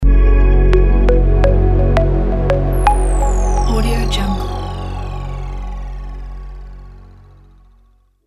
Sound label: logo elegant